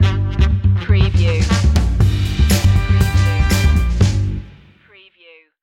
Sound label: funky baddy stinger